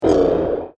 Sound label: Land Speeder, Spacecraft Sound Effects; science fiction sound effect; There Goes The Land Speeder; star wars / trek style games